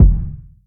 wet bass drum sample F sharp key, steel kick drum tuned to the F# note